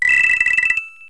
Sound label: coin